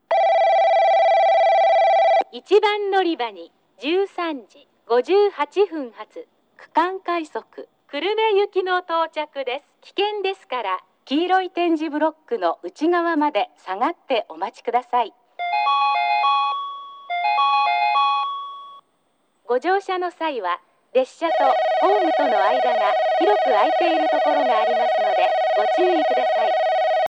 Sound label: regional rapid kurume, portable recording